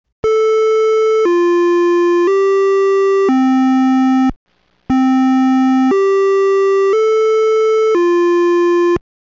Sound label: chime, siren; emergency